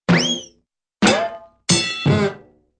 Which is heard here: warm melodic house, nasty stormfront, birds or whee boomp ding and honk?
whee boomp ding and honk